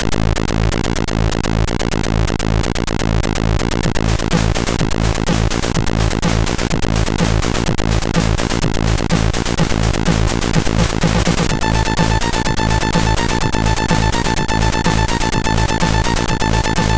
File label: Techno, MOS6581R3; sid